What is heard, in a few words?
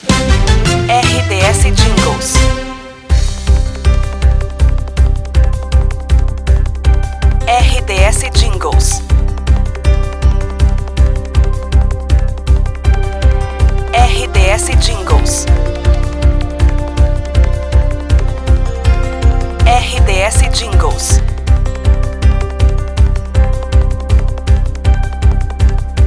news trilha news; Silvestre FM